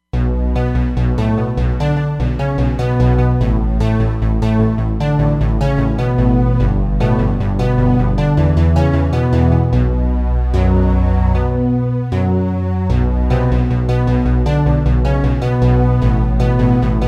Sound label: OilDrum Bass; Roland Fantom XR